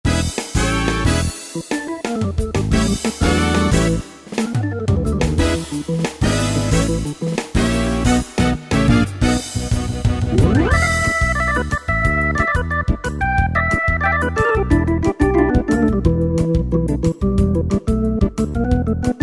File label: Organ Groove, Yamaha PSR-3000 swing & jazz style; Factory Styles